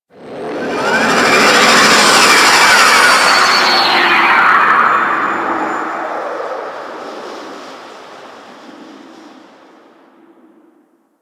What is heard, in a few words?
Sector Wars Dist Liset Fly By; Warframe; Tenno